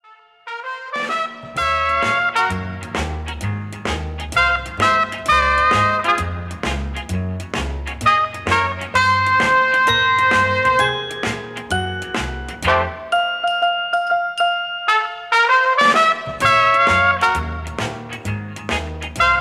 Band

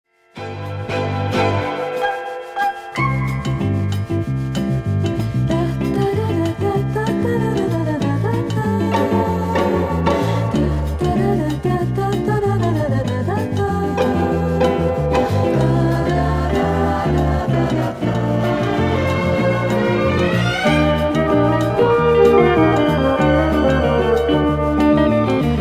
Bossa Nova Chromatica

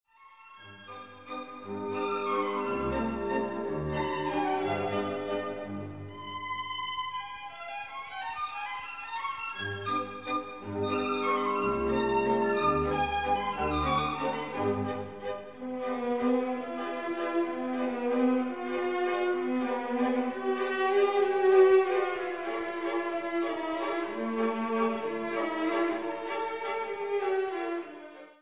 opera, Waltz; Les Contes d Hoffmann opera in 4 acts; Olympia

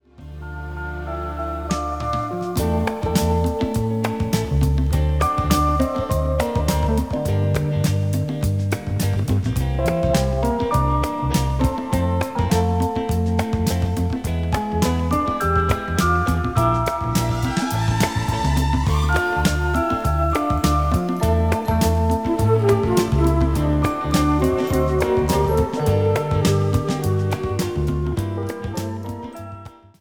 Strings

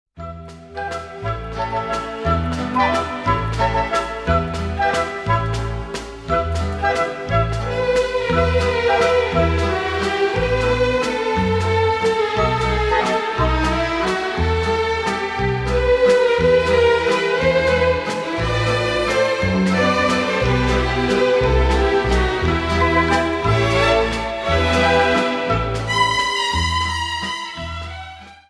Orchestra

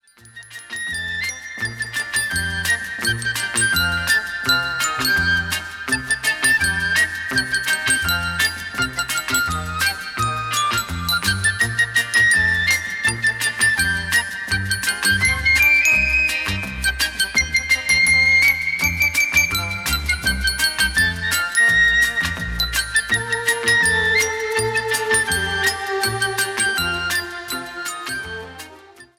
Orchestra and Chorus

